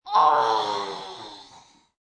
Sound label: Groan Raspy, Moan, 1 child, raspy, pain with breath; human voice, kid; Groaning